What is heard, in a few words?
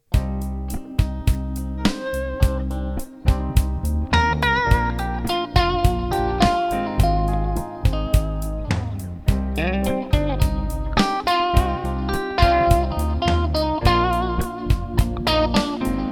Cm 105 bpm; admiralbob77